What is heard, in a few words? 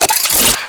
Reload; Warframe; Akarius Prime